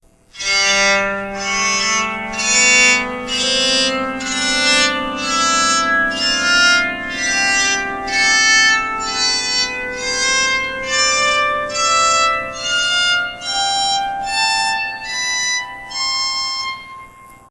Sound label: Bronze Scale, psaltery